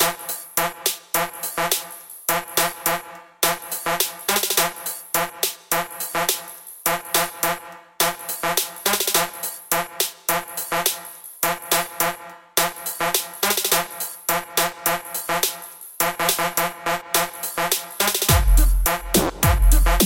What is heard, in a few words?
Kit Loop Full